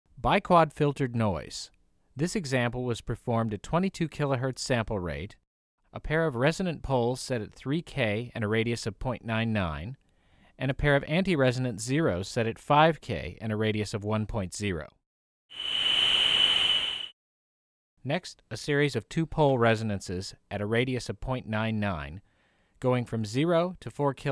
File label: BiQuad Filtered Noise